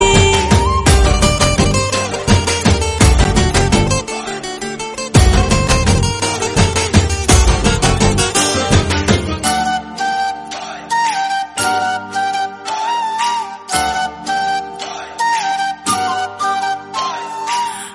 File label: Instrumental